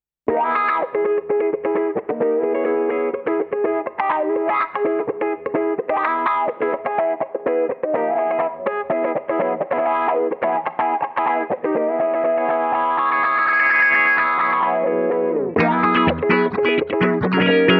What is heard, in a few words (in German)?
Ein wenig funky